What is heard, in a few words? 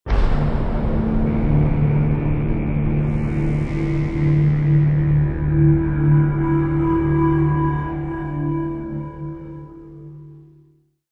Modern Foreboding; Civilization Revolution; xbox